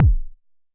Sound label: Jomox MBase, drum machine; Jomox MBase 01